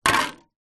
foley tin small empty no lid with water in down on ground; zapsplat